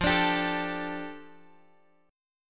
F 6th Add 9th F down Guitar Drop D, chord

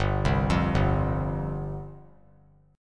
G 7th Suspended 4th G down; G7sus4 arpeggiated

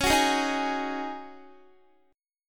Db Suspended 2nd Suspended 4th Db Guitar; strummed

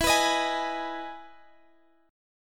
E Major 7th E, chord

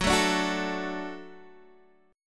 F sharp Minor Sharp 5th F sharp Guitar Open D

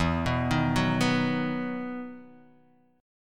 E Major 7th Suspended 2nd E, Guitar, Open D; chord shape

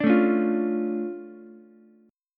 D Minor Major 7th, D, Ukulele; DmM7 strummed; JGuitar

